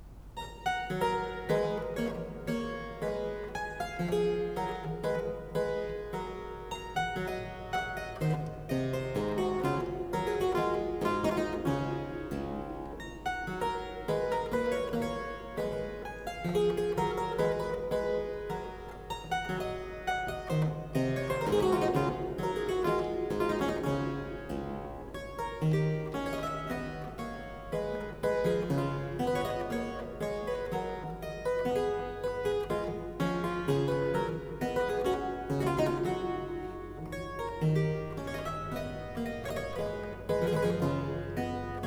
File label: Menuet in G major, Keyboard Works; Mozart